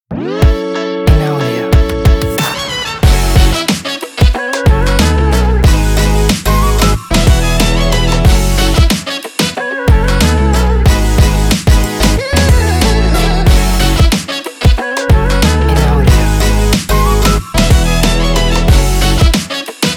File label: jazzy logo